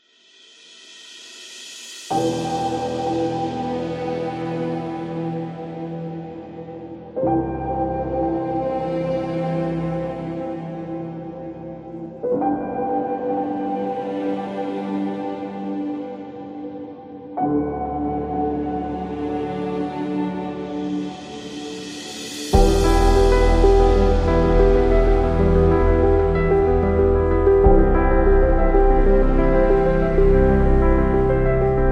Emotional Piano

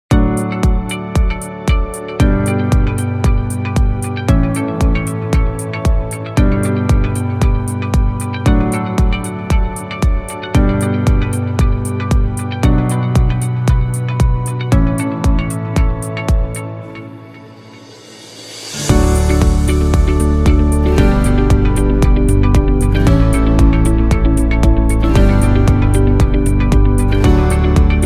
Friendly, corporate music; corporate music vol 21

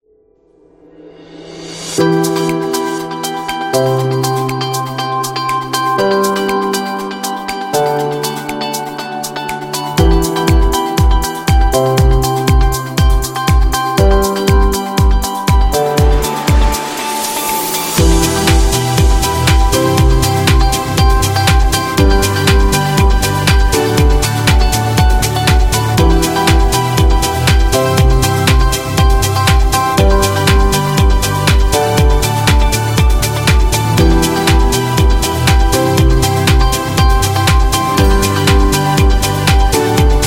Upbeat and Uplifting; corporate music vol 14

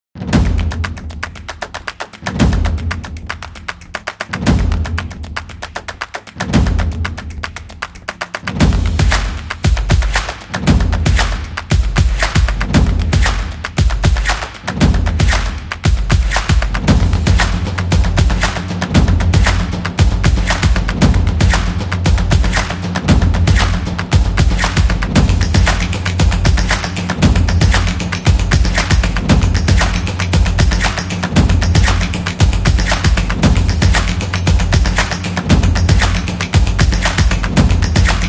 Tribal, tribal dance

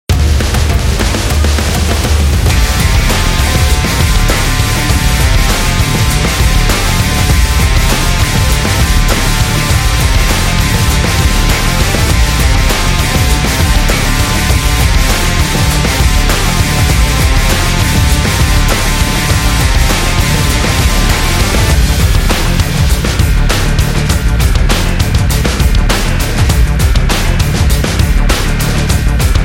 Rock Background, electro rock

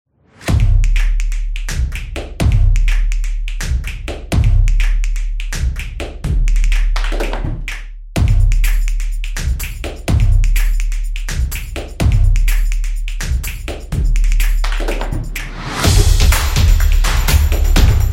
Drum Beat Upbeat; high energy

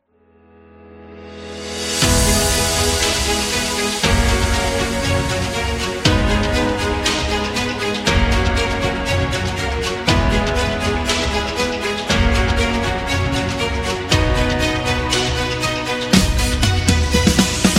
Corporate Trailer; corporate promo

